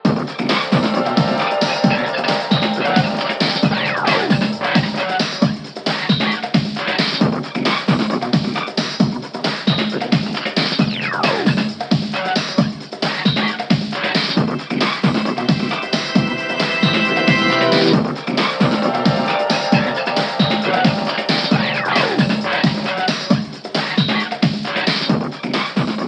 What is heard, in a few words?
BoomBox loop